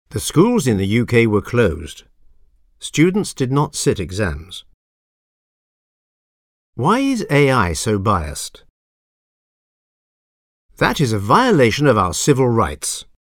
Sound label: techno